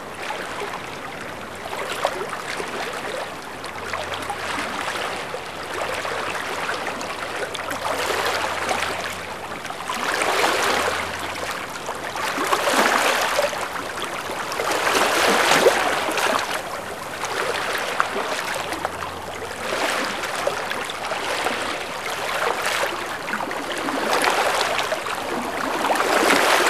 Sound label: amb lagoon water lap; Titanfall 2